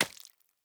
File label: drip, beehive; minecraft; block